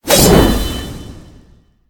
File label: Swing; smite; god susano